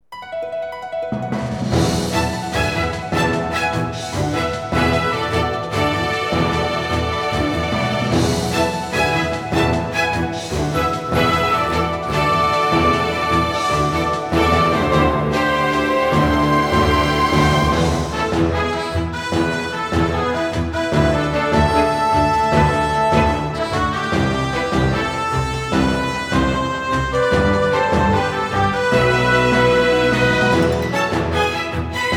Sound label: Orchestral; Dragon Ball Z Kakarot